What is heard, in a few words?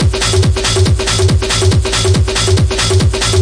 140 bpm, scum queen, drum loops and breaks